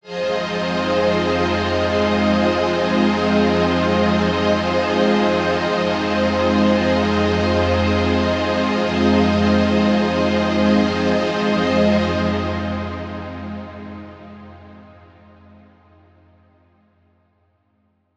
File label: pad mids F